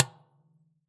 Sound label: Dustbin, IR; Claustrofobia v1.1